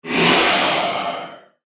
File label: Ghost Dragon; horror